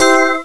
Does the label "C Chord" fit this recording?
Yes